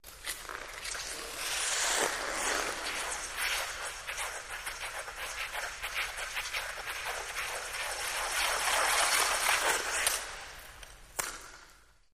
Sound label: Ice Skating Spins